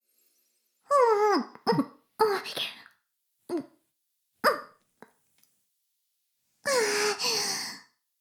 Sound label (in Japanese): フェラ音声; 古き城のドラゴニート2